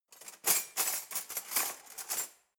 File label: cutlery knives drawer handle